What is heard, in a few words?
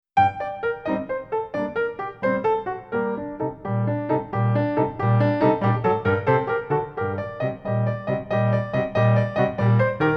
scarlatti sonata in g minor